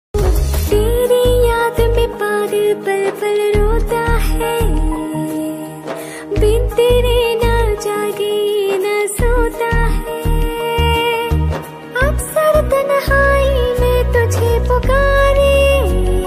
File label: Sad Status Ringtone Hindi Ringtone